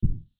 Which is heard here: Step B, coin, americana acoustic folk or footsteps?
coin